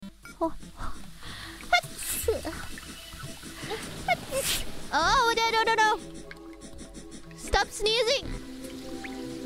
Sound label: Sneeze